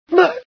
die, female

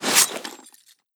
draw, wpn

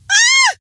fear, scientist female

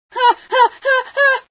scream, female sci

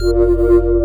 health charge loop

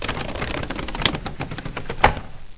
door move

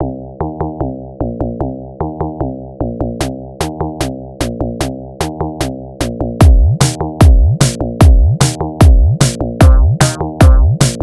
150bpm; rage

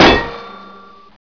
head hit; desastre

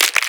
snapbug die

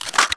p90 mag in; weapons

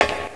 metal

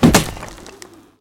repair stone

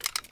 place, rpg7

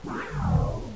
pain, houndeye

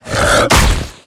zombi attack

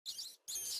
rat alert

